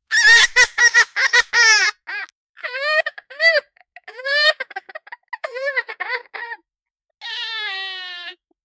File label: puppy crying